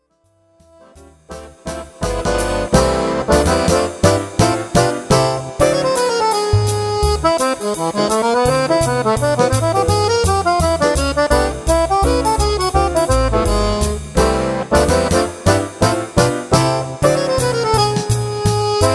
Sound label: jazz time